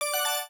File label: combo